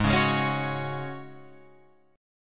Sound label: G sharp 7th Suspended 4th G sharp, Guitar Open F; G#7sus4 strummed; JGuitar